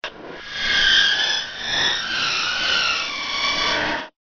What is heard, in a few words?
Creepy noise